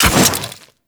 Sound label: Quill Dart Gun Mech; Warframe